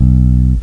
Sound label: agog bass